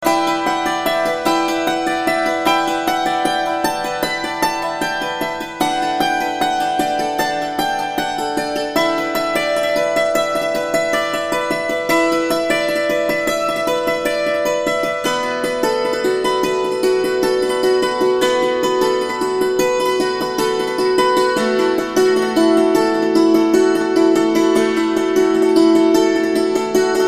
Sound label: wedding Bells